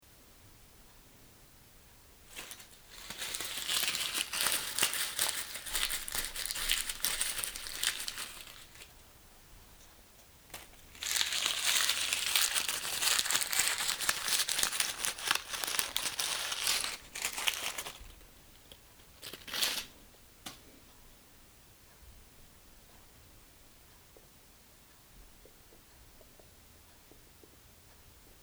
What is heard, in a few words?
binaural